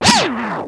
scratch Stop, Yamaha Motif ES; Yamaha Motif ES-Series

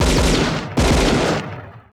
SHOT SEQ; Total Control - Action Cutz

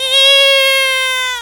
WildFX, African; Quazibeat; African Secret; SFX

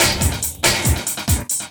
LOOP SD, 140 BPM; Ueberschall; Drum & Bass Resonance

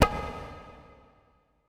E TAB SLP G#

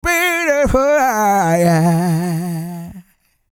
SING, Vocal Planet R&B & Dance; ILIO

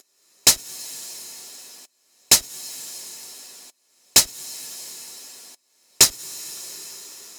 Perc Sizzle Hats; Percussion; Zero-G; Future Wave

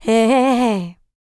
licks, vocal, dance; ILIO; Vocal Planet

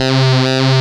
STRING C2; CMP - Analogon; FLORGAN

